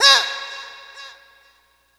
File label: kit c#6, EMU E-III Dance Kit